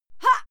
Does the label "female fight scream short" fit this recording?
Yes